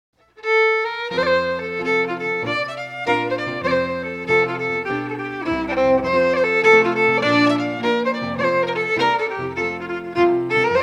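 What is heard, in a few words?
Reel, Scottish Fiddling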